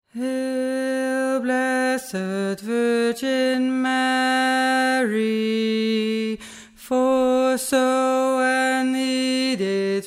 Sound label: Hail TENOR